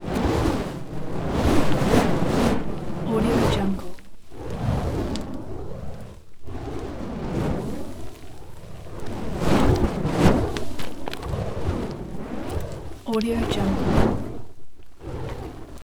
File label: fire ball on chain; عبور گلوله آتشین